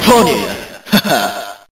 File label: ahaha